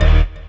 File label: DANCE STAB; E-MU Formula 4000 Series Vol. 2